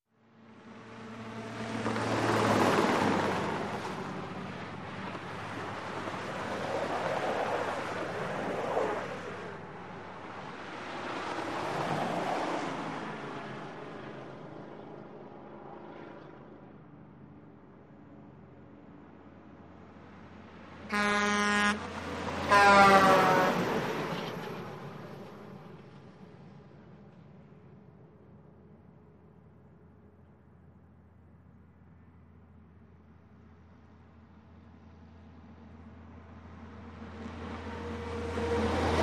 Highway Traffic, Light; Close Perspective Cars Trucks With Doppler Horns, Tire Whine, And Rattles.